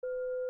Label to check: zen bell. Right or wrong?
Right